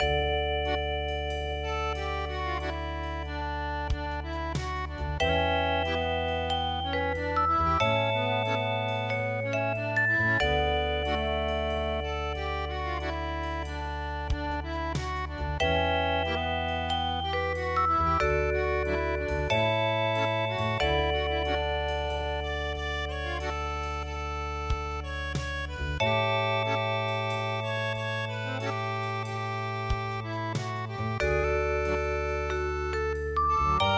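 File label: paris musette; O Sole Mio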